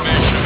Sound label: close door